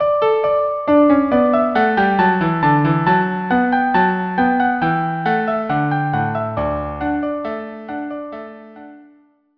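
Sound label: violin cello duo; La Vailance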